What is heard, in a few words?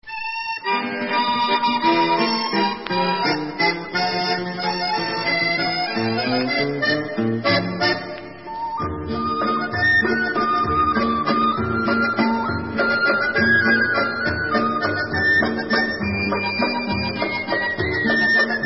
Instrumental; Alexandrova; Pioneer